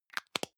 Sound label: deo can lid close medium, badezimmer; haus-garten